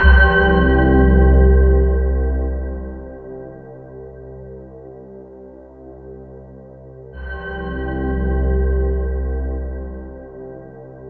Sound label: C2, Synth Line; 90 s Sample CDs; NiceBeats; SynthLine VOL-26